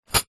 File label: tent pegs in pouch down on ground; foley